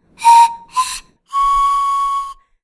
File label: Scale